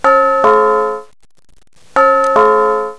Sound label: chime